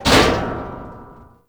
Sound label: Military Checkpoint Metal Hit; Warframe; Ambience; Nineteen Ninety Nine